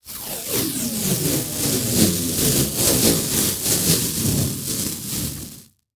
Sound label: Industry Tool Tape Duct Tape Peeled Slowly Off Wood